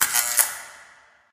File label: light flicker; Source Engine; Portal 2